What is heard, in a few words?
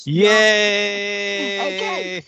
yay